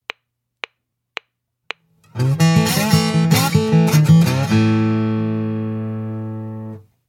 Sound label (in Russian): Слайд гитара